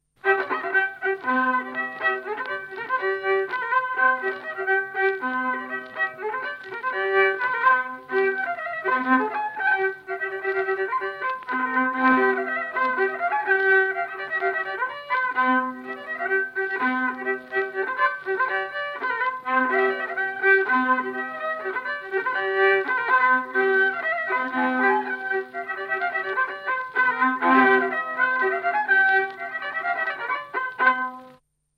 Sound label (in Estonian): Aadu polka Kihnu viiul folk